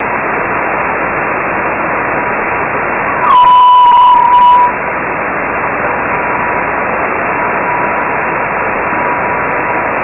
geminide ping navspasur

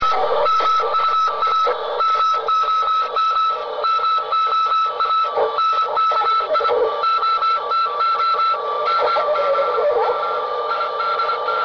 digital telemetry; cosmos376

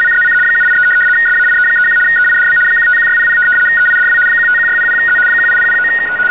baud75

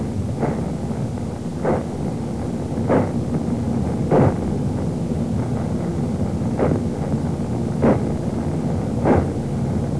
solar eruptions

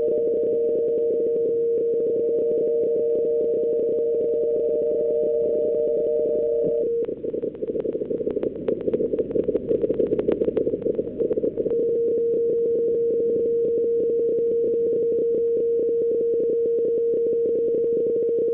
qso bpsk31; g4ydo

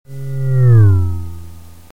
doppler; maxmsp

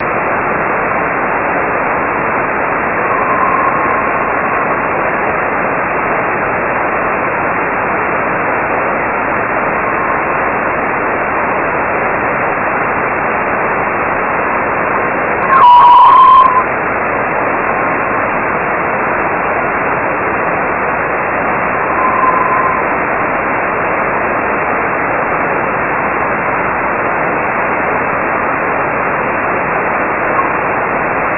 geminide 3 pings navspasur

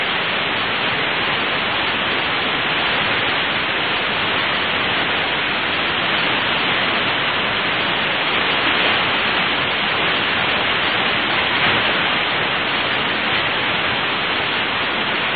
jupiter l burst hf

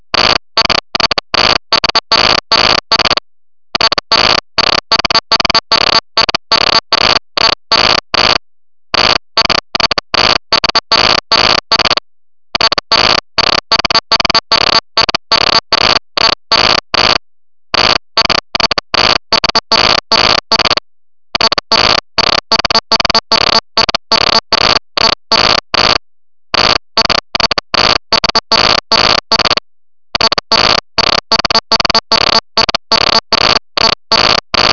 mode hellschreiber

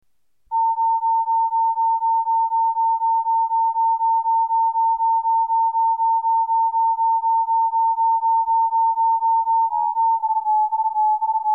mode throb

